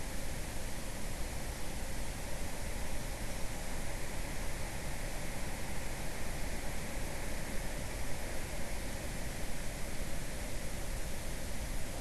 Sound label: WFR audio emfisis